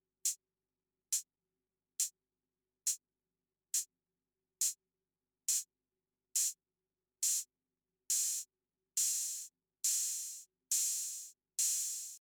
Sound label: Open HH